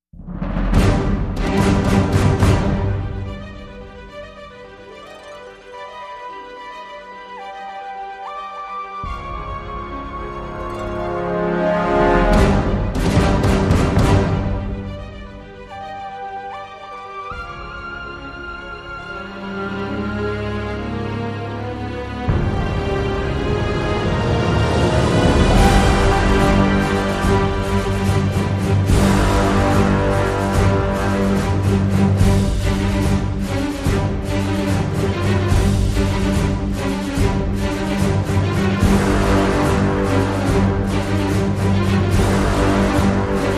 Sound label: No Choir